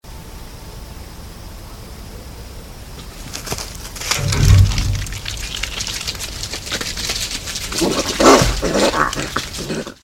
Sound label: Raccoon